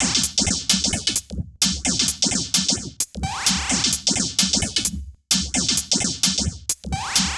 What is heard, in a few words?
Hh-Fx Loop 130; Equipped Music; Loungin House; Hh-Shake Loops 130